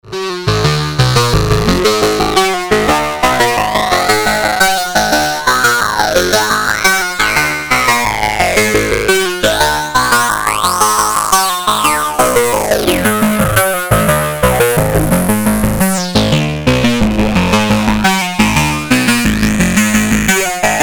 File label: overdrive